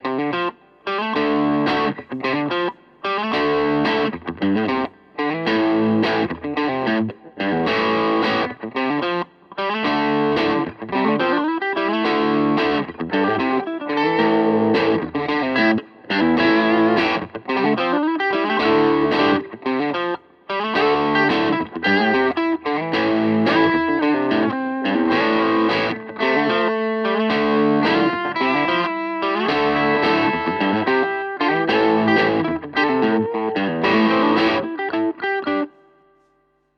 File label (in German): Maybach Harley Benton Vergleich Maybach Crunch